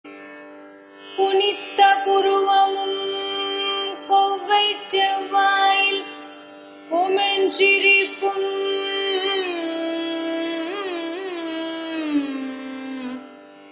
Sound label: carnatic song thevaram vasantha and bilahari; appar